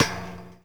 Street Ball Metal Back, Street Basketball; SFX Kit

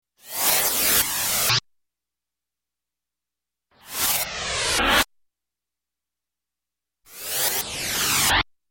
Space Several Stabs, Electronic; Sound Ideas 2000 Series

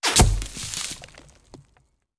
Bullet Impact; Sound Ideas; Guns

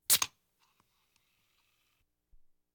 Household Phones

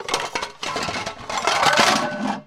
Dishes Pans Rattle; Foley - Rattles

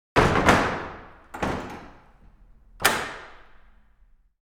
Wooden Window Shutters; Inquisitor 2

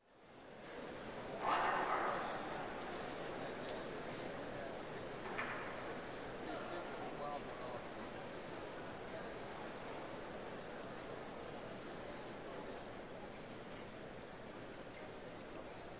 Track and Field; Sound Ideas 2000 Series

